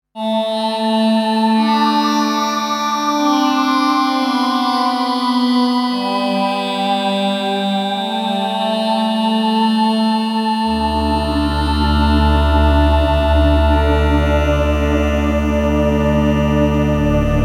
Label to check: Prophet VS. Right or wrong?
Right